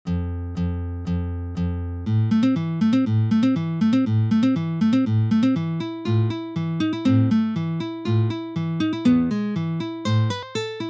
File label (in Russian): fingerstajl